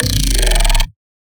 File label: Bass Shot X Gnarly, Dubstep; Ghosthack; Advent Calendar 2018